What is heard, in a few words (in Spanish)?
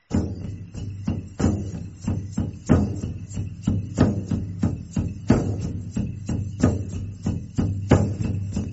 tambor lakota